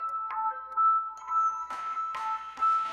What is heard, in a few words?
banana slip